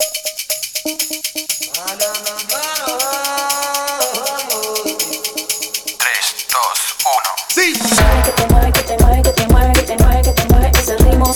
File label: Latin Beat